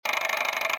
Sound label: Spinning Wheel; Cookie Jam